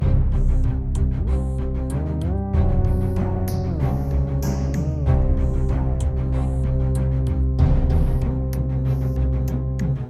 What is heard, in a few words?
Tension Loop; the jump dimensions; android ios macos mobile switch windows xbox series xs